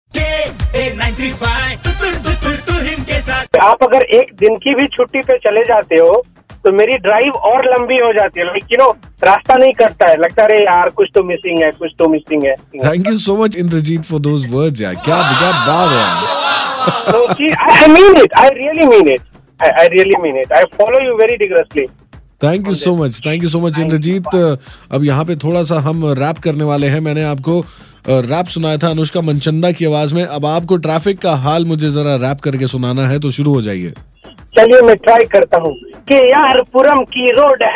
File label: the traffic rap